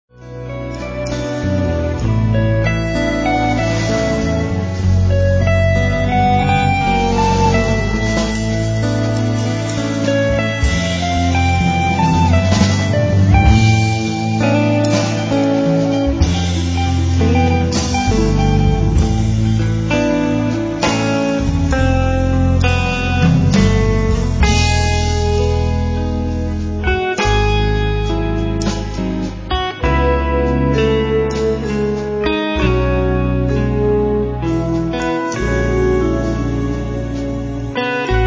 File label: Dark waltz